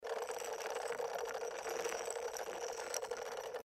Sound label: reel Spin; Enchanted Prince 2; Eyecon